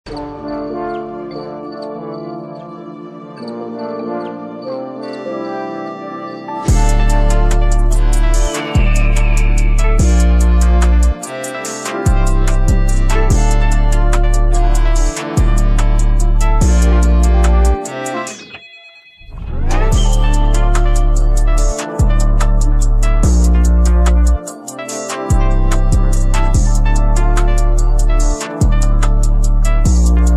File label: Instrumental